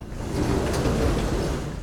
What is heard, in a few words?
elevator door open